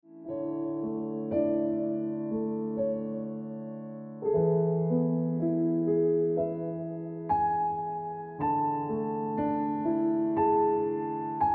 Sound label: Piano Music